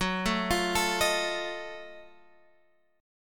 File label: F sharp Minor Major 13th, Guitar, Open G